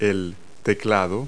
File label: keyboard; Multi-Lingual Talking Picture Dictionary